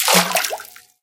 water, footsteps

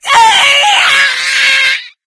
ignite, infected, female; Left 4 Dead 2; Source Engine

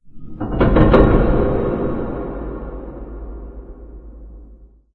warehouse ambience rand; video games; ambient

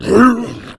boomer pain short

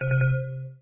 windows xp hardware fail; operating systems

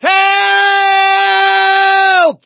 fall, gambler, survivor voice; source engine; left 4 dead 2

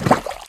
mud footsteps, infected walk

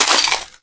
glass, footsteps

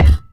physics cube impact soft

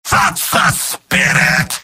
positive vocalization; demoman; video games; source engine; tf; mvm

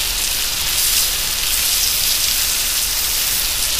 laser beam impact; Source Engine; Portal 2

